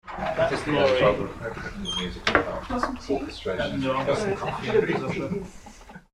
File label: orchestration